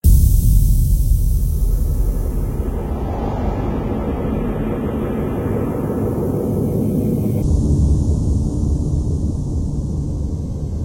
Obscure Granular Ambiences; Whitenoise Records

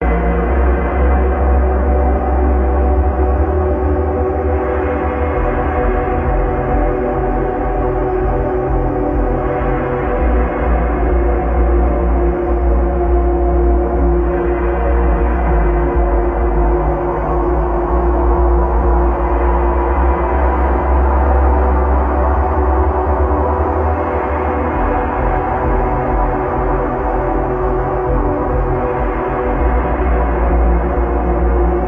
Dark Soundscape

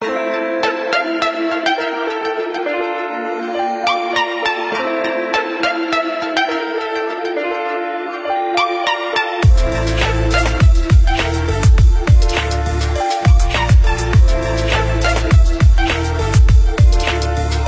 Music Big Tide Hip Hop

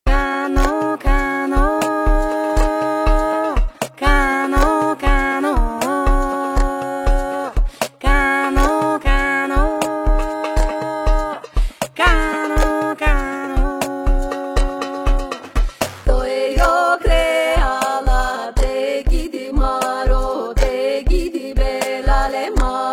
Ethnic Dance Vocals; Fume Music

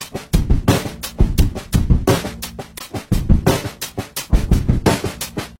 Drum Backbeats Breakbeats and Effects; Drumdrops

